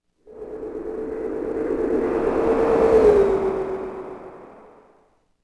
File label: City Flyby Small; Ambient; Sid Meier's Civilization 4 Complete; Beyond the Sword